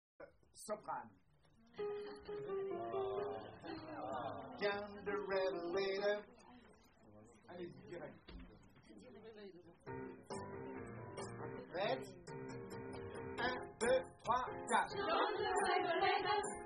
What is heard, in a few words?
John soprano